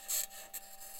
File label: mic, mars2020 edlcam